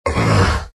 pain, vo; arc warden

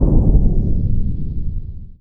pit target; underlord

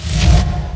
preattack; weapons; dota; shadowshaman

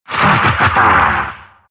silt laugh; dota; siltbreaker

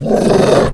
death junglestalker elder, creep deaths; dota

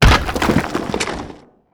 burrow out; weapons; dota; nyx

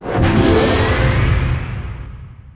guardian cast; dota; omniknight

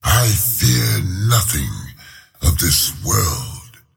attack pain; slyrak; dota

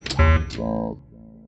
plink game locked; ui; economy

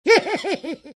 alch laugh; dota; alchemist

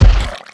step, footsteps; pudge

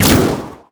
arrow launch; weapons; dota; mirana

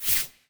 chat slide out; ui

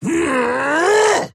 attack effort large; dota; axe

